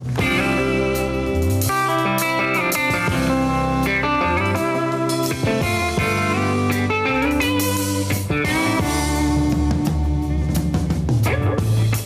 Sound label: Fender Strat 62; Rockpalast 79